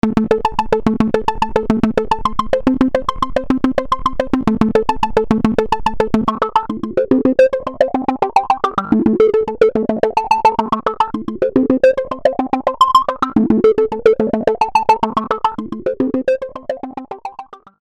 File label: Loop On Speed